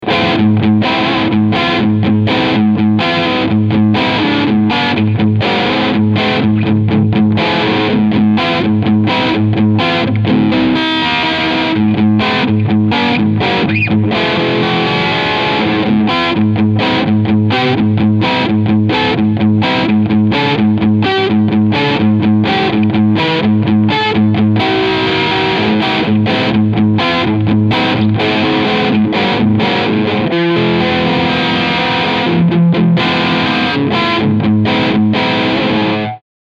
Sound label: Harley Benton SC Junior LTD Overdrive Rhythm